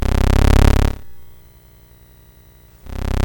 BASIC sid MOS6581R4, GAMES; Sword of Fargoal

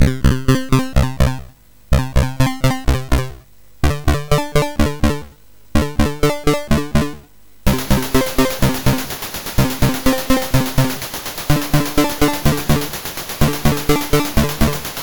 Single Echos, MOS6581R4; sid

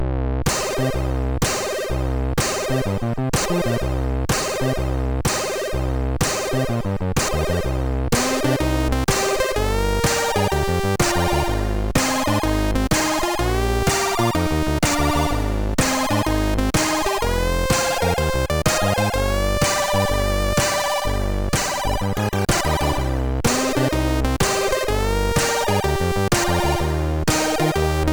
Title Remix sid MOS6581R4; Metal Warrior

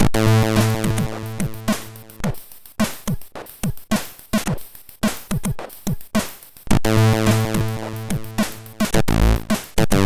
Funkt MOS6581R4; 7D Funkt

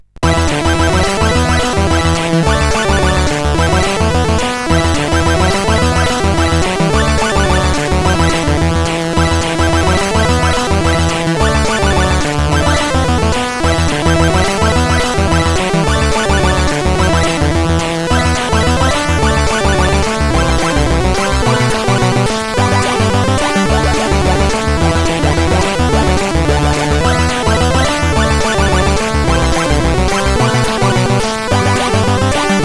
Shoot em Up Gametune, CSG8580R5; sid; hvsc

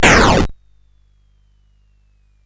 Frantic, CSG8580R5

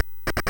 Musicbox, sid MOS6581R4

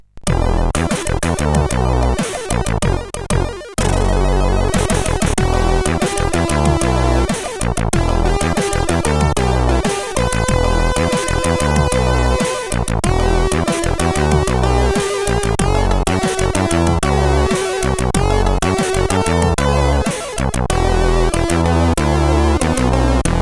Short Tune, sid CSG8580R5; Spike

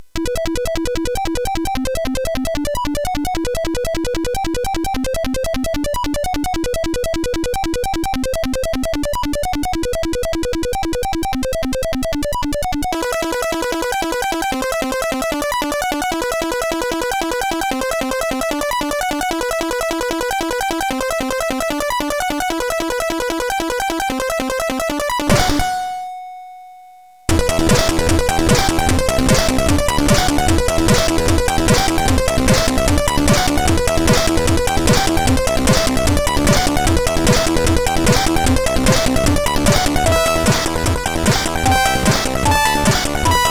Raindrops, CSG8580R5

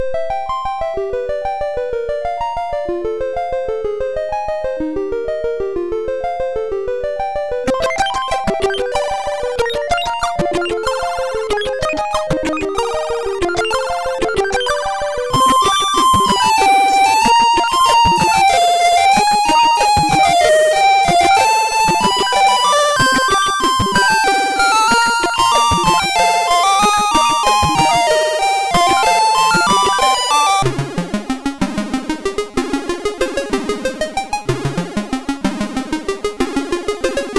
Medieval Tune, MOS6581R3; Thoerndahl Jesper

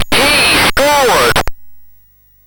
MOS6581R4, GAMES; Slapshot

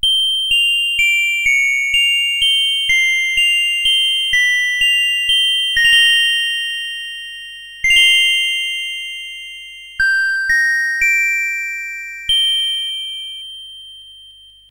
Game Music, sid CSG8580R5

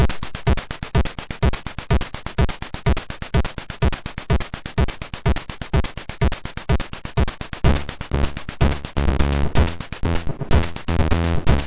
Sound Effects, MOS6581R2

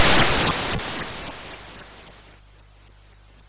Frantic, MOS6581R4

